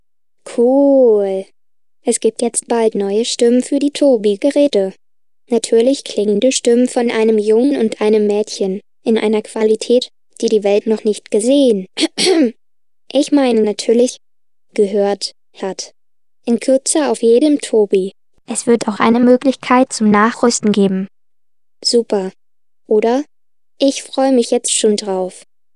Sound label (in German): Kinderstimmen Probe